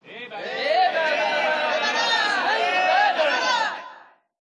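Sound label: ambience Baseball Crowd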